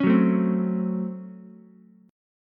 C sharp Major 7th Suspended 4th Sharp 5th C sharp, Ukulele; strummed; chord